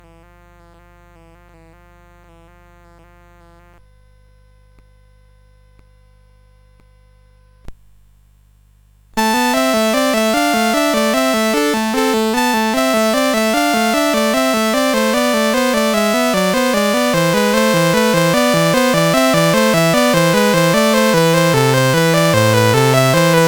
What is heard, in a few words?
English Suite 2 Bourree, CSG8580R5; Lord UK